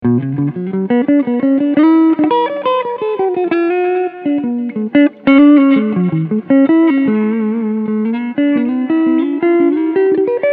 Cleansound Front PU

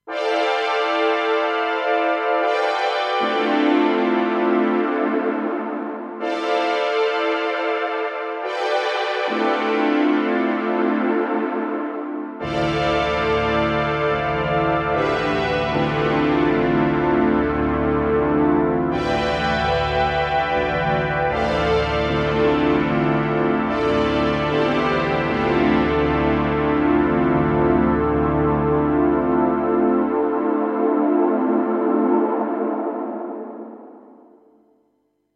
Interval Pad

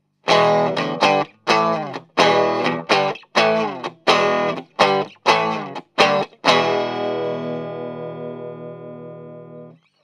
Fredenstein Magic Pre Strat Amp ME64

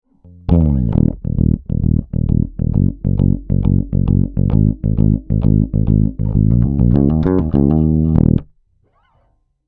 Bassline

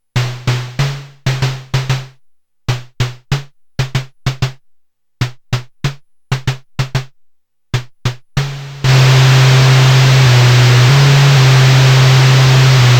Erica Synths DB 01 Noise

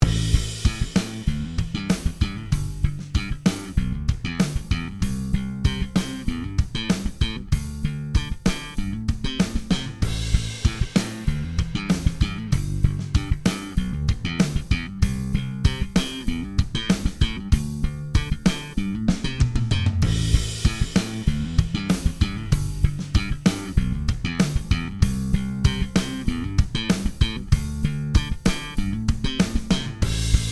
Drum Bass Chandler RS660 Limit Slow to Fast

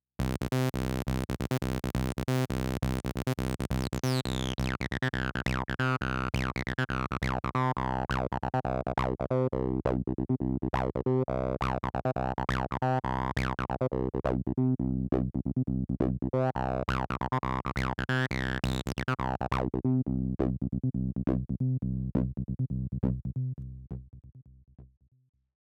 MB33 VCF Accent

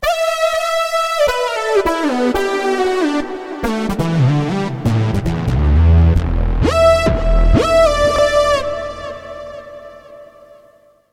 Keys Lead

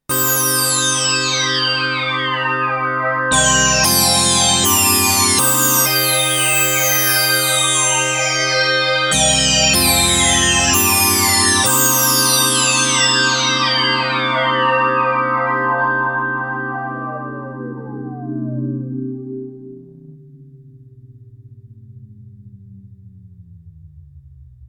Polysix Poly

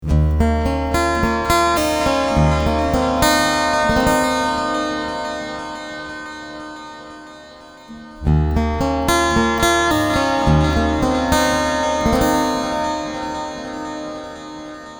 Delay Reverb

